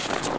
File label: snow, player